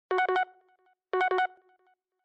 Beep, Message tones; LG KF755 Secret

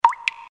soft, keytone; Huawei U1305

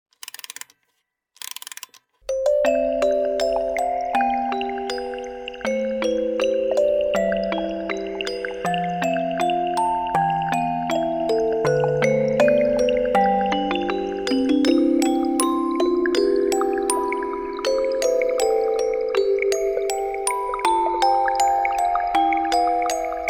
Music Box

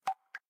Lock

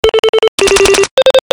notification; Infinix; A88

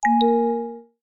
Drift, notifications

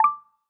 notification simple; Alerts and Notifications; Material Design

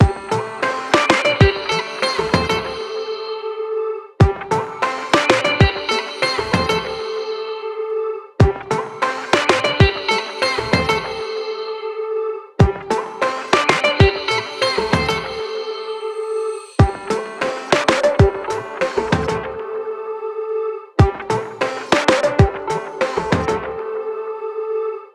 Silk Road Bass, ringtones

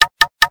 Knock, notifications; LG SU870 Optimus 3D Cube

